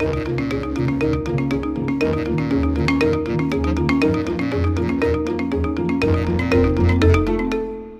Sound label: classic xylo; music world